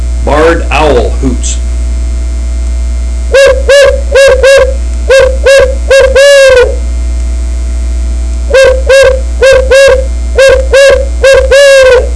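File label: barred owl hoots, turkey hunting; Southland Sounds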